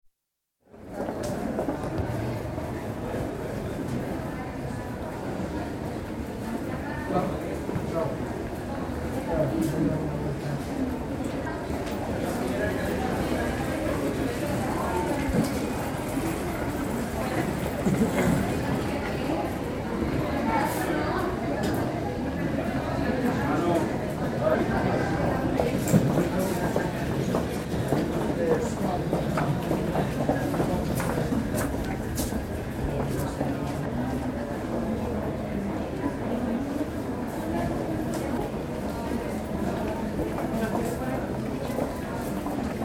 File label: Spain: Built-up narrow street, general atmosphere; City; Cities; BBC